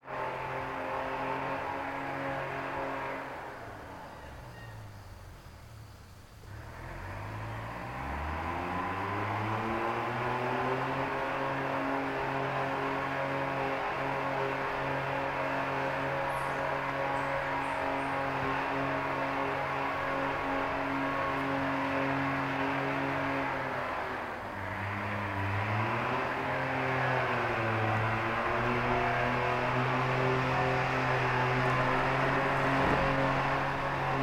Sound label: industrial logging skidder pulling logs out of forest; sound effects one